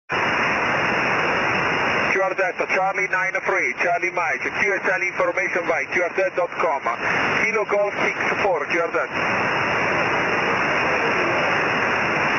6m ssb

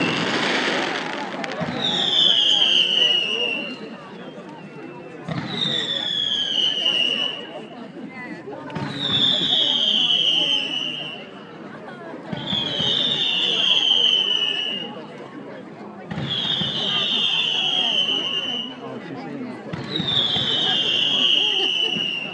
fireworks at bedford rugby club

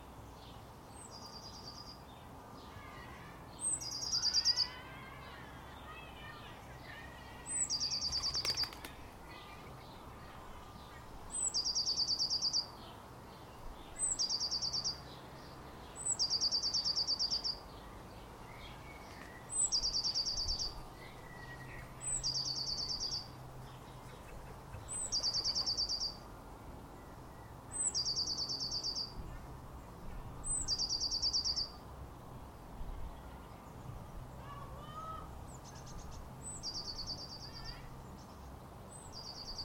beautiful birdsong in garden with a windchime

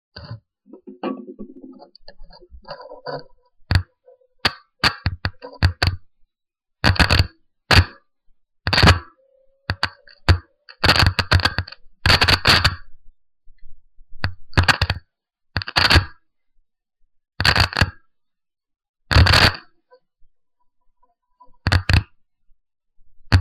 battery static

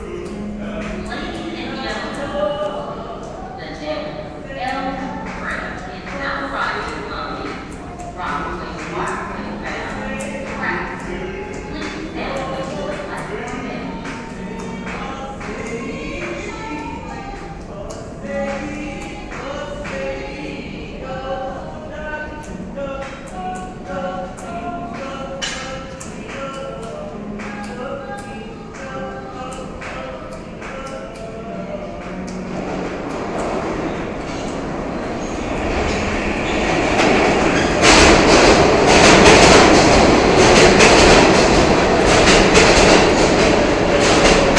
sounds of the subway, Bedford Ave

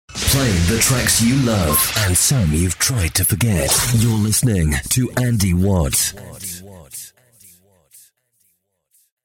jingle

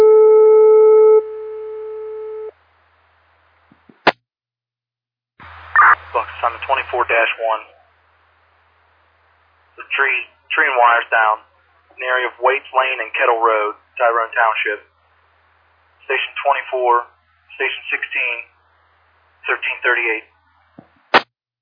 page received; sinking valley station 24

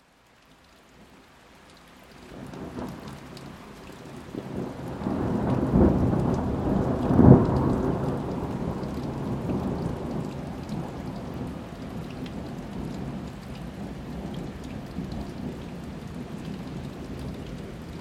Nature Recording: A Thunderstorm in May

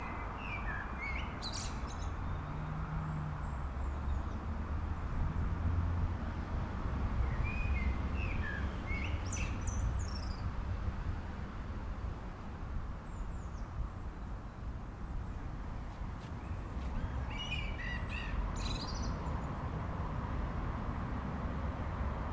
blackbird in Slough

